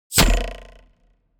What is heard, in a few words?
weapons bow arrow arrow hit